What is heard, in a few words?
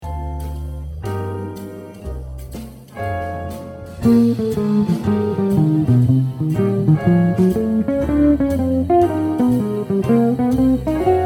Lick